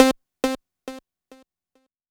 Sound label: C4, SynthLine; 90 s Sample CDs; NiceBeats; SynthLine VOL-1